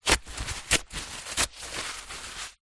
foley kitchen towel paper rip sections

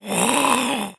fantasy Goblin; little robot sound factory

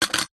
badge metal set down table

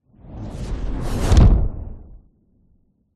fantasy magic spell dark whoosh into thud

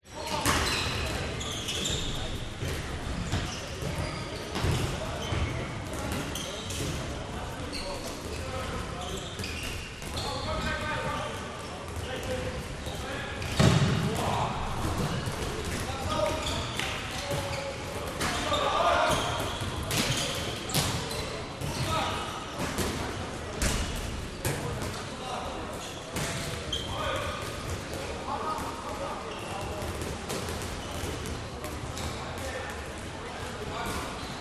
youth handball match internal Germany

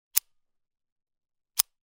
gun bersa; still north media

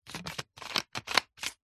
foley bottle plastic lid screw or unscrew; zapsplat

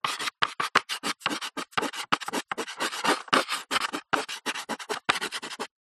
chalk writing short sentence on blackboard

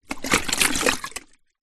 household kettle water movements

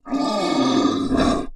horror monster growl or grunt

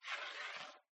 Coin Slide On Wood; PMSFX

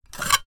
foley metal scrape short drag

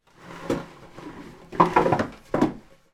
household table move drag carpet hits chairs

